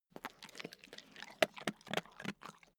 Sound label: stafford eating